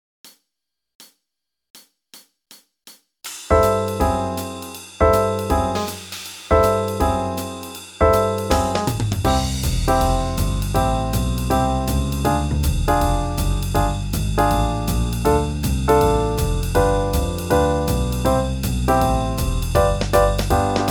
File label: Backing Track C major 160 bpm